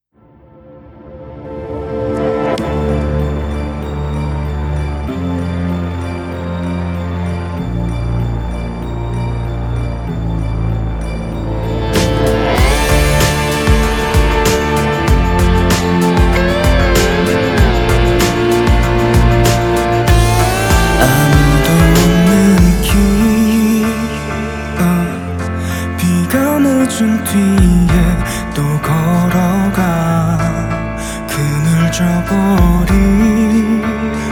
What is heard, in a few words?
Rainfall